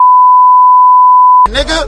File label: death; freak fortress 2; g nine